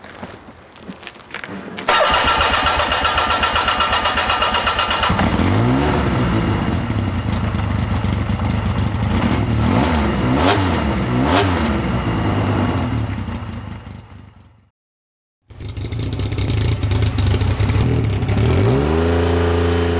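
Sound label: Laverda CR1000, motorcycle; 1980 Laverda Cropredy Liberator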